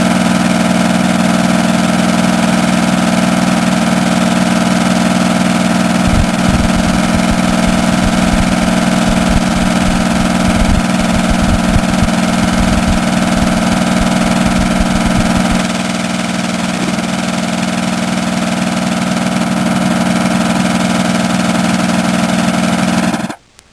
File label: Diesel Generator